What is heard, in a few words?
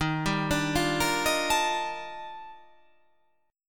D sharp Major Sharp 11th D sharp, chord